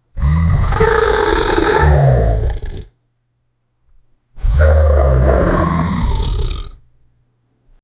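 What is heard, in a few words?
natural booming tiger roar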